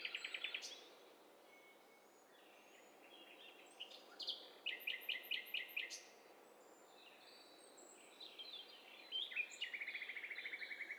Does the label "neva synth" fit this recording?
No